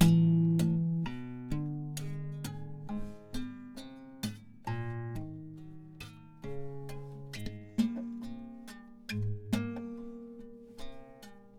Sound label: rhythm